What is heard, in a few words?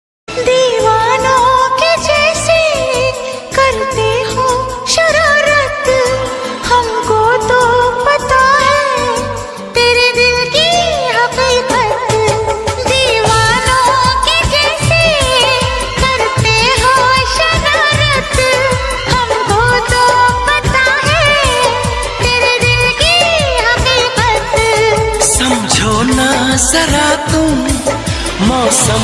love ringtone sad ringtone breakup ringtone